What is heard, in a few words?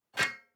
DICE on Metal, Grab, Standard, Two Dice; Samples; ArticulatedSounds; DICE Sfx Party (Extended)